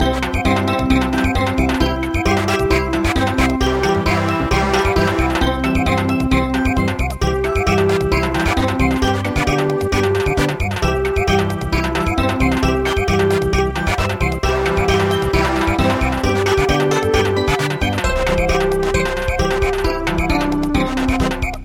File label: Samba; Gom se mali